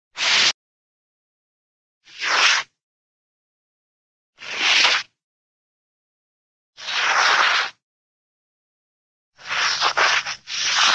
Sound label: slide, cartoon short slide